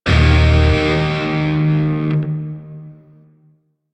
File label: Shawzin Blues Chord E; Grineer; Warframe